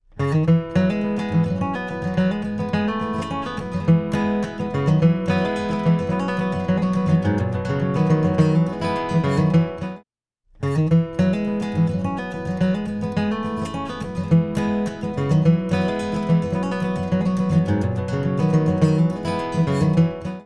eq hi peak shelf; audio examples from the book

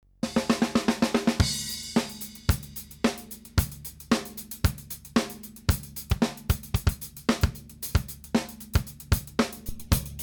flange drums

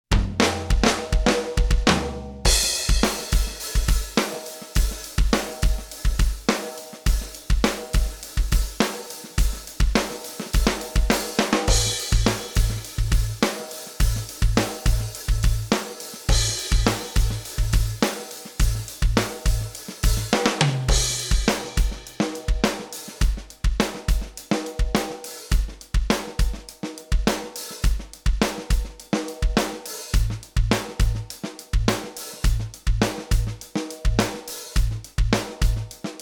mix with kick tone

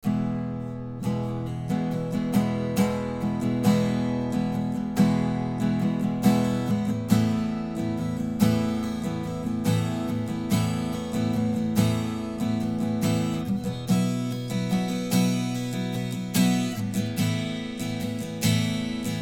aural exciter

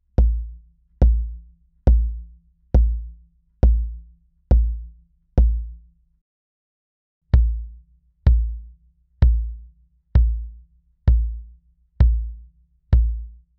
kick close middle

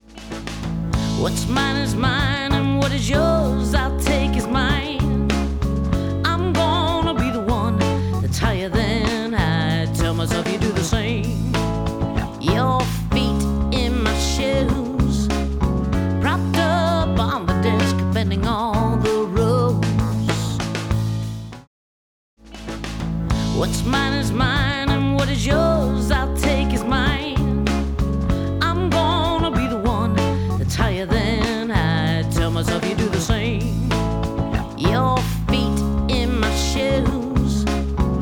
tape saturation out in; audio examples from the book

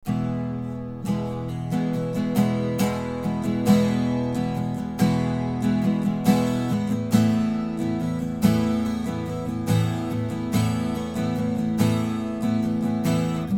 chorus; audio examples from the book

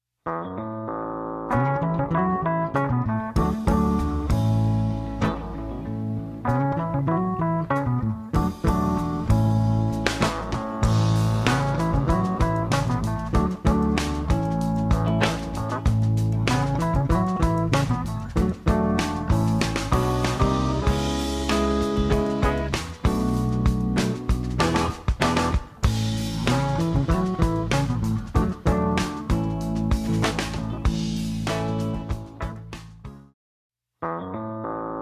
mix verb out in; audio examples from the book

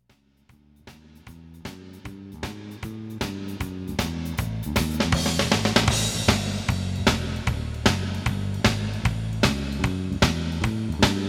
reverb large hall; audio examples from the book

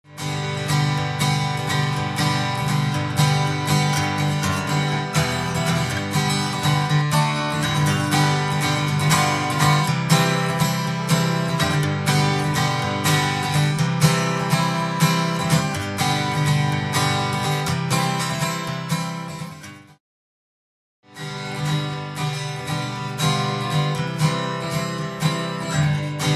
delay acoustic out in; audio examples from the book

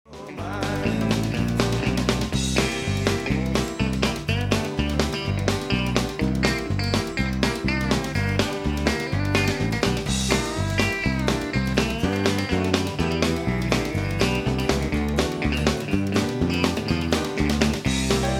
missing kick; audio examples from the book

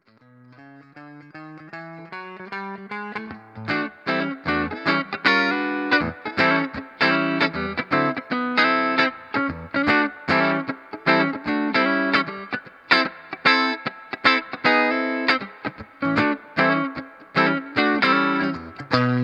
dry no delay; audio examples from the book

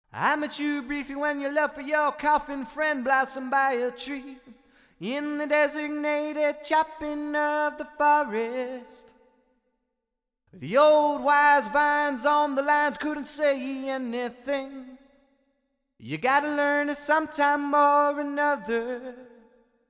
too much verb

